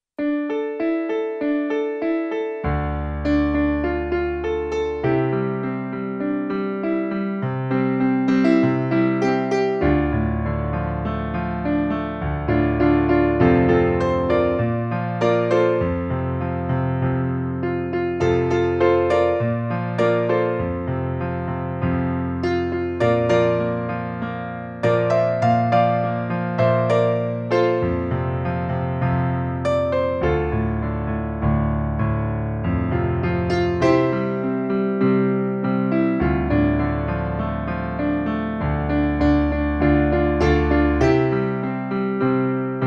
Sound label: Piano